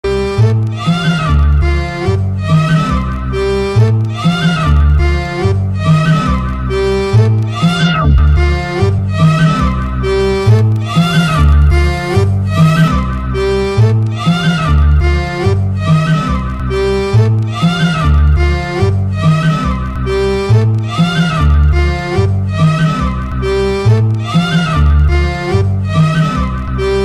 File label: instrumental